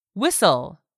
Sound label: WHISTLE